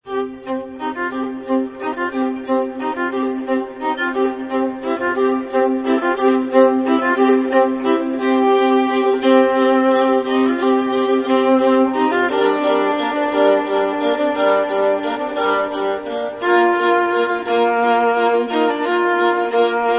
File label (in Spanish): version violin; juego de tronos; SERIES TELEVISIÓN